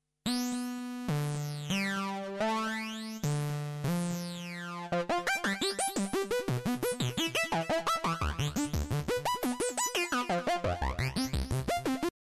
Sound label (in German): Arpeggiator, Ein Beispiel mit und ohne Arpegiator; virusc; Das Ergebnis kann an harfen- oder sequenzartige Strukturen erinnern.